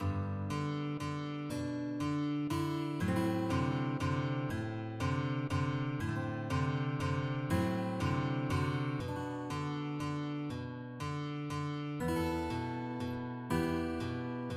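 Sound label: waltz guitars, guitar duet; waldteufel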